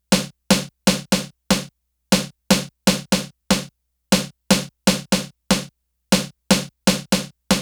120 snare loop so snappy

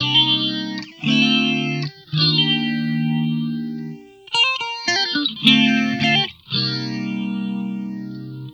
FX D major 110bpm

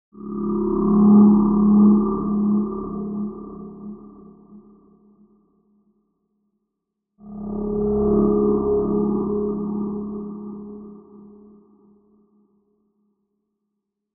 dark warehouse creeper

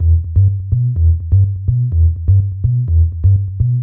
Bass 125bpm G m Synth

